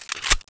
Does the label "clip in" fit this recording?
Yes